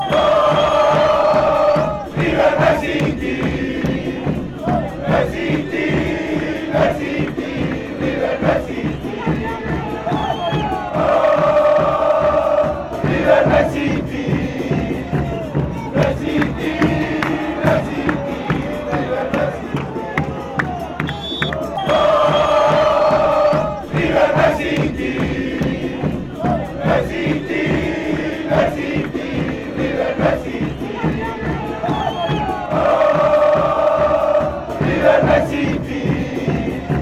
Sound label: patronato chant